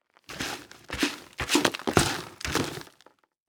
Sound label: Household Broom Sweep Dirt On Cement Five Times